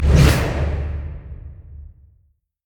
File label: summonerspell base ghost; LoL